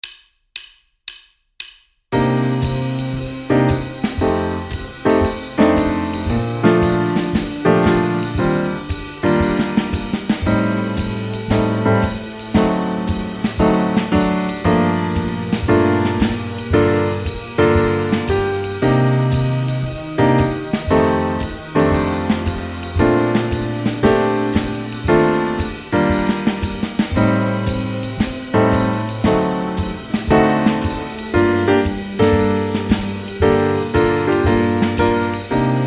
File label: Gmin 115